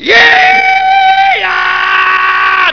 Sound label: Yay ahh